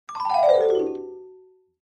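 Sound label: Clavichord Descending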